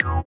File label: SITAR; Spectrasonics; Metamorphosis; INDIATEK